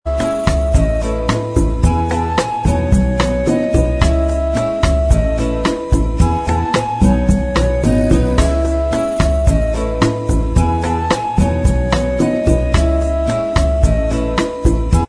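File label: bossa mix instrumental track, backing tracks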